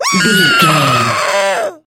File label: voice female scream